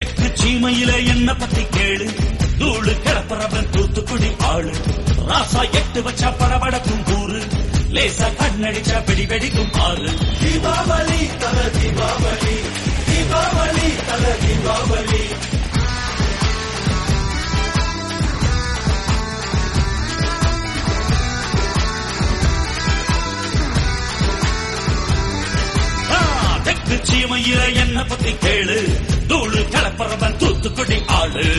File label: Movie Tamil Song Ringtone; Attakasam Movie